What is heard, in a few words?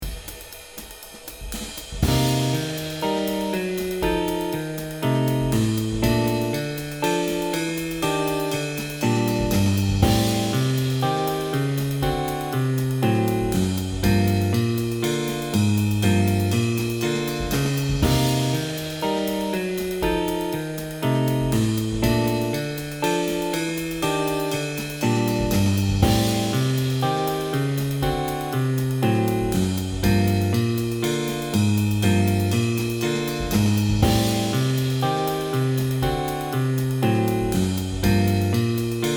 Walking Bass